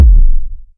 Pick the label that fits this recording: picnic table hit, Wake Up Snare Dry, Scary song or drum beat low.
drum beat low